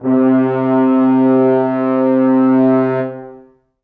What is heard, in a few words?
brass acoustic, muted trumpet